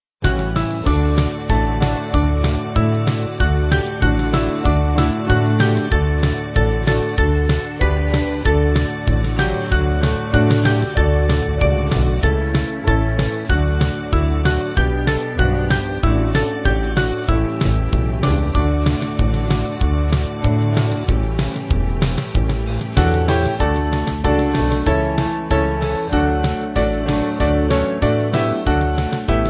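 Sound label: Band, Music Backing Files for Churches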